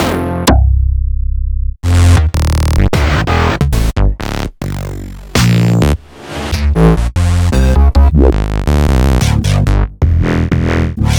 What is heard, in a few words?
Synth Basses; Sliced